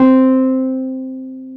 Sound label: PNO, Wurly